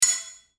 Clank

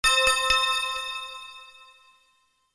Bells

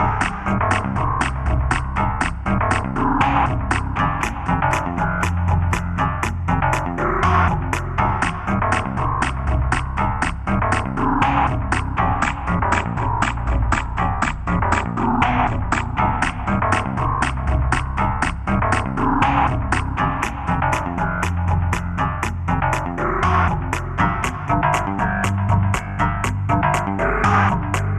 Quirky Construction LoFi, puzzle music

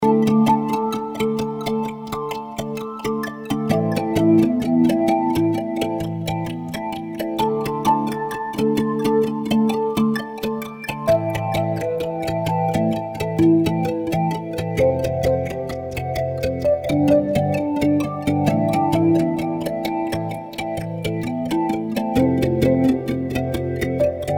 Clippity Clop Looping

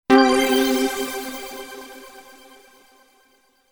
Power Up

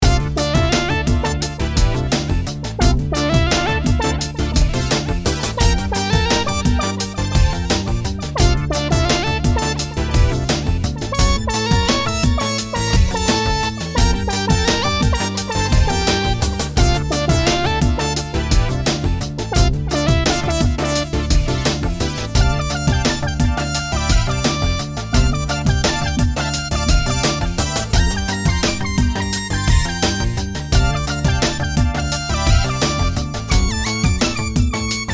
Squishy Monsters Funk Rock Band

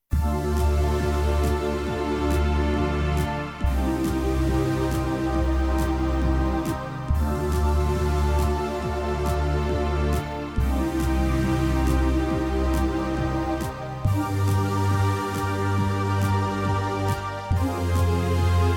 Strange Game Menu

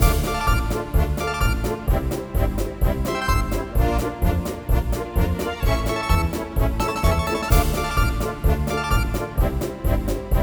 Cartoon Chaos, funny

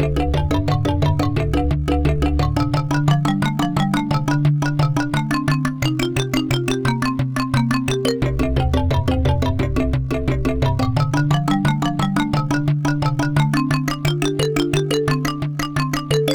Monkey Business, funny

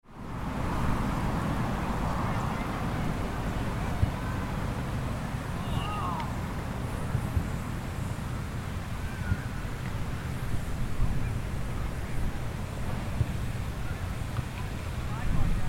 Suburban Park

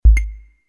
UI Quirky

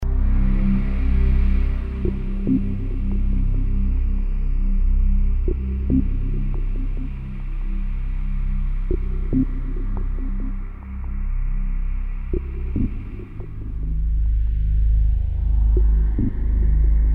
Darkness Approaches Looping

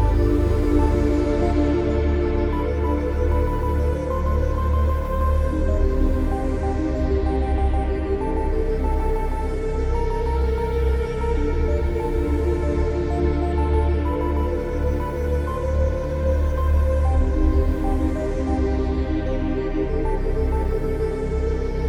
Evil Technology Game Menu, fantasy

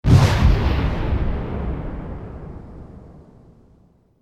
Explosion

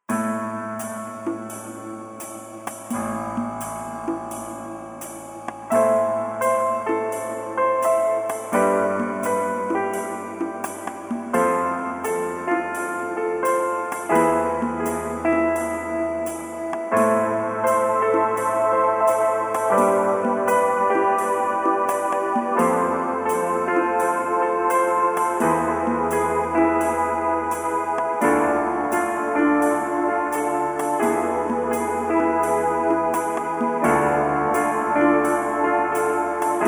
Stage Door LoFi

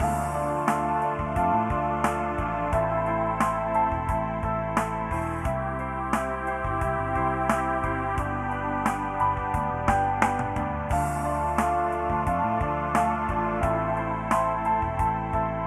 Dreamy Hollow Lo Fi, fantasy

